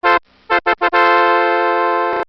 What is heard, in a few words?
Level Complete; Realone; arcade puzzle